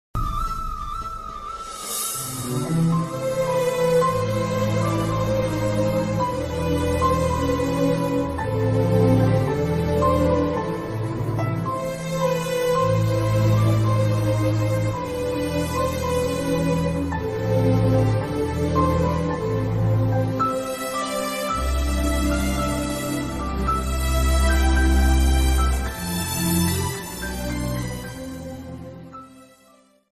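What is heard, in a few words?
telugu movie background music ringtone; Parugu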